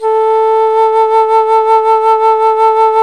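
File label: alto flute, vibrato; Roland L-CDX-03 Disk 1; A.Flt vib 2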